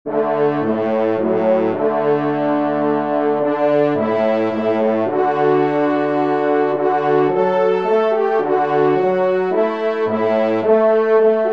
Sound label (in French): Valse, trompe; Loisirs du Chasseur; Pupitre 3° Cor; Henri Sénée